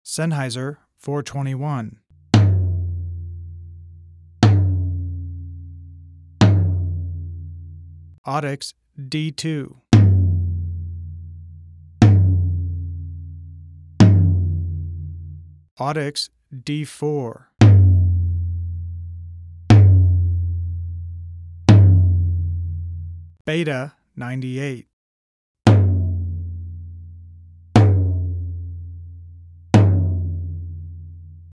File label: floor, mic samples